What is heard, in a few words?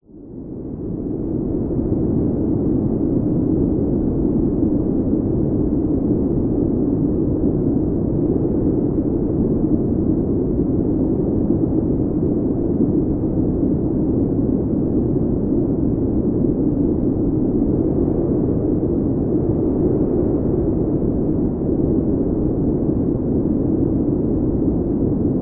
nature wind cold blizzard constant designed; zapsplat